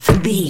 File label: bullet impact wood heavy